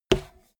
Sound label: bmx bike tire kick